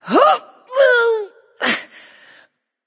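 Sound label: grunt lift, female; smite; voicepack; hera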